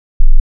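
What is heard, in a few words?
waveform FM sine triangle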